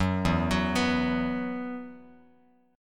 E Suspended 2nd E, down, Bass Standard

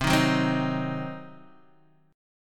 C Diminished C, Guitar Open D

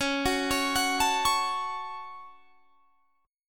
Db Suspended 4th Sharp 5th; arpeggiated; 21,21,23,23,22,21

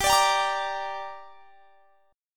G Minor G down, chord; Gm strummed

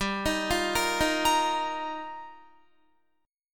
G Minor 7th Sharp 5th, Guitar; Gm7#5; arpeggiated; 15,18,15,15,15,17

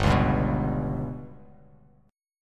G Major Flat 5th chord

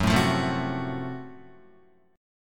Gb Minor Major 7th Flat 5th Gb Guitar; strummed; 4E,4A,5D,5G,5C,6F; 2,0,3,2,0,1

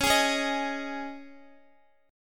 C sharp Suspended 2nd C sharp Guitar, chord; C#sus2; strummed; x,x,11,13,13,10; 4E,4A,5D,5G,5C,6F

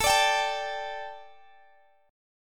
A 7th Suspended 4th Guitar Drop D, chord; x,x,19,19,20,17

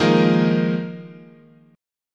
D sharp Suspended 2nd Suspended 4th, chord

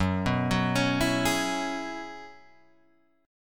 Gb 9th Suspended 4th, Guitar Open G

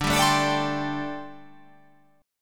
D 7th Suspended 4th, down

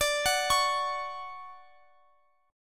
D-Major 7th, D, Guitar, Drop D

